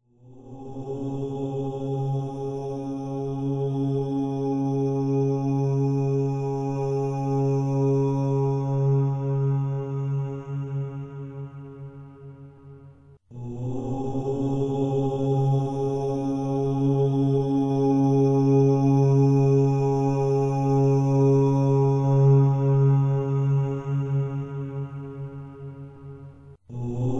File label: OM Meditation; guided; 20 Minute Meditations